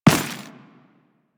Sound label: Run Dirt, Footsteps; Warframe; Teshin